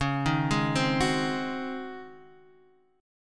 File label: C sharp Major 7th Suspended 2nd Suspended 4th, Guitar, Half Step Down; C#M7sus2sus4